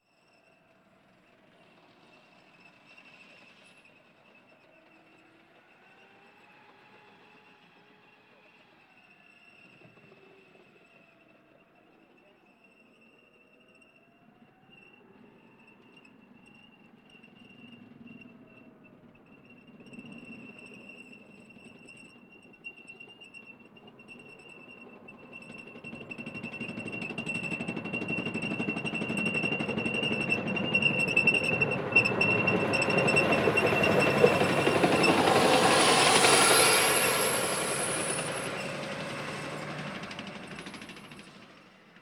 Military vehicles

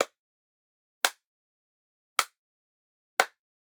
Generic Click Plastic Small; boom library; The Interface

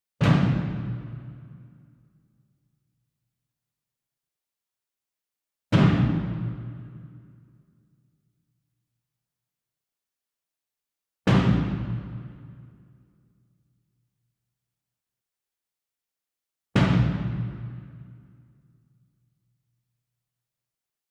ENSEMBLE DRUM Bass Drum Marching Hit Far; boom library; Cinematic Strikes Construction Kit

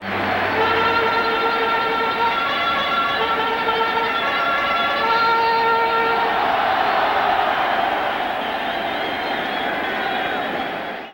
Baseball Crowd Charge; Crowds

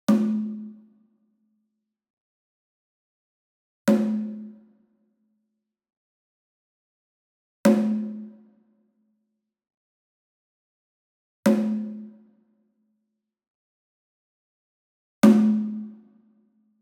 SMALL DRUM Tambora Hit Hard Beater Medium; boom library; Cinematic Strikes Construction Kit

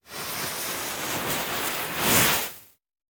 Fuse Black Powder; Sound Ideas; Guns

